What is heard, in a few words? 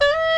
BA WU D 3